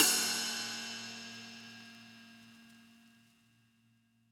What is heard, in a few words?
ride; eddyflux